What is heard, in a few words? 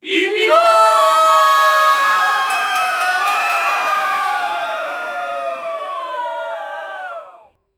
short cartoonish, mascot